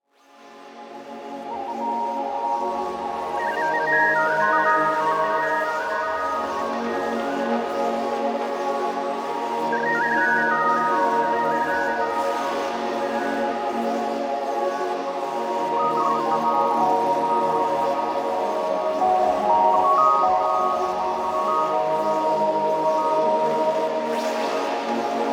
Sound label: Sky Whisper Pads